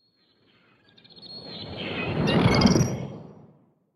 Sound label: sea animals; Blind Drive